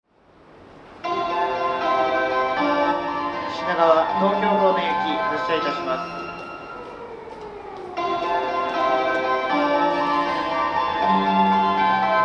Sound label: shibuya melody, Tokyo Train Tunes; japan